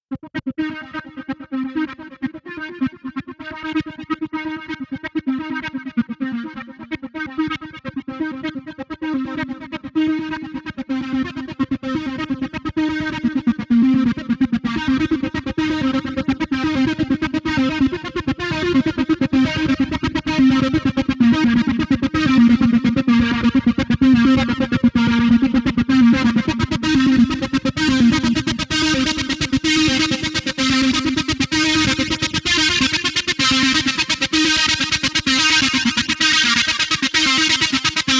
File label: arp; saffron